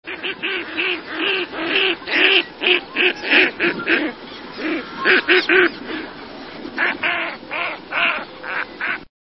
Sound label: Redhead, songs